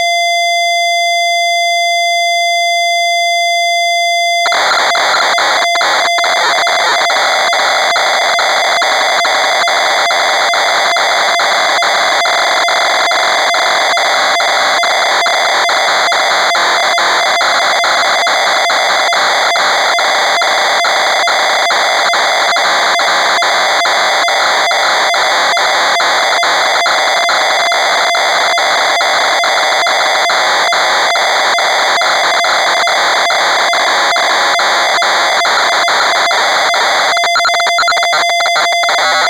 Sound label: BOMB